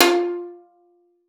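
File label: violin pizzicato F5; Xsample VOL-5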